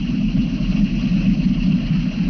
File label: Flame Thrower Idle Loop; Weapons